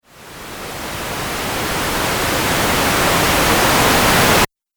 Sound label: Trailer Riser White Noise; Rise And Dive